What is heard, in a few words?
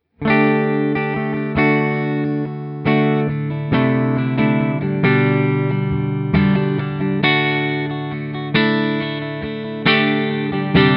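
Pickups, Guitar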